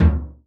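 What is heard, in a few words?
BD MED, 50S BRUSH KIT; Northstar - New Gold VOL-2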